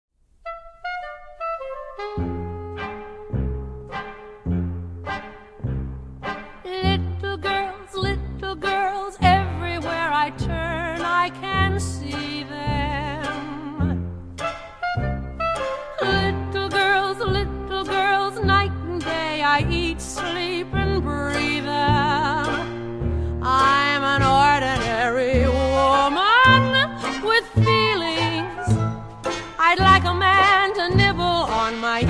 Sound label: Vocal Guide